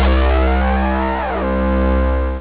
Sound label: zap; xfce